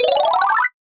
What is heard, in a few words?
start